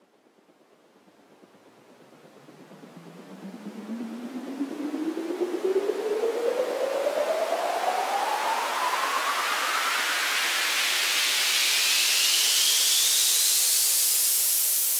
Air White Noise Up; House; FX

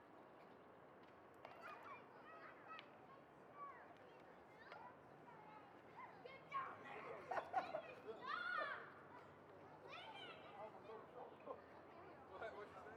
Drum Loop - 148 BPM Ambience; Loop Stems; Cymatics; DOPE DRUMS VOL. 1; Friday Drum Loop

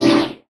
Collectibles Various FX; Cymatics; Video Game Sample Pack Vol 1

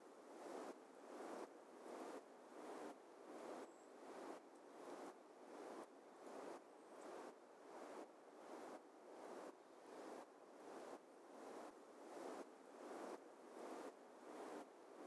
Hallway Knock Drum Loop 82 BPM Texture; Drum Loops; Loop Stems; Cymatics; Mystery Pack Vol 4

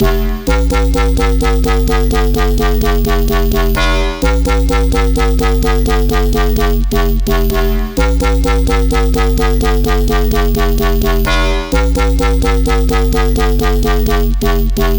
Bass House Loop, 128 BPM F; Synths; Drop Loops

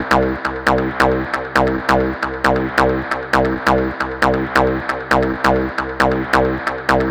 Synth Loop C 135, Techno; Ghosthack; AC2024 - Day 1 - Techno Sounds 2025

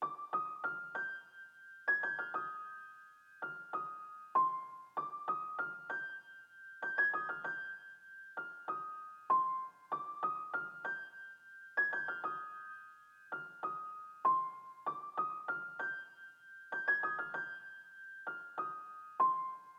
Classic Melody Loop - 97 BPM C Min Piano; Cymatics; Oracle Sample Pack

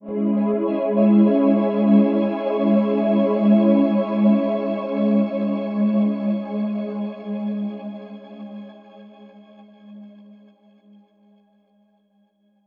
TEXTURE 137 BPM G# Min; Ear Candy; Cymatics; ANALOG EVOLUTION Beta

